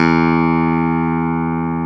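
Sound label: clavinet E2; AKAI S-Series CD-ROM Sound Library VOL-8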